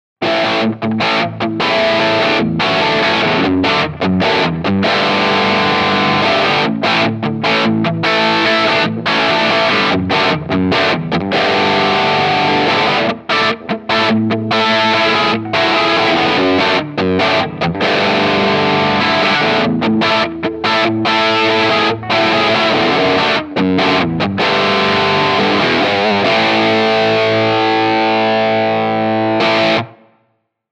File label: ESP SC 20 Bridge PU high gain rhythm